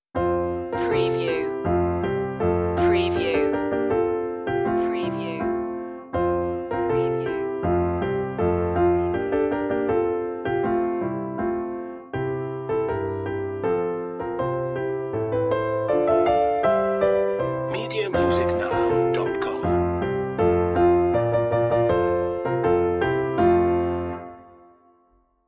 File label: deck the halls piano